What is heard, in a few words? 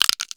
NUT CRACK